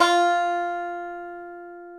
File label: banjo F3; InVision Interactive; Lightware VOL-1; Instruments & Percussions